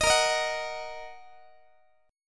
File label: Bb Suspended 4th Sharp 5th Bb down Guitar; Bbsus4#5 strummed